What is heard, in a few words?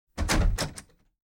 Door Knob Grab; MinorHallutinationsSounds; SFX Producer